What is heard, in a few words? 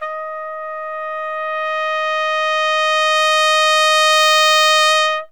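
D#, crescendo trumpet; Zero-G; Phantom Horns; CRES.TRUMPET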